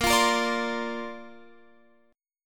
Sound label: Bb Major Add 9th, Guitar, Open F; strummed; x,17,17,17,17,19; Bbadd9